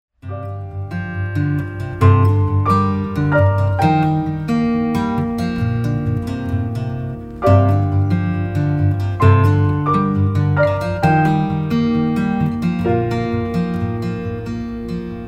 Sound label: Piano